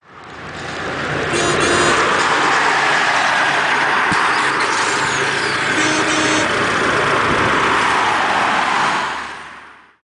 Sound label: autobahn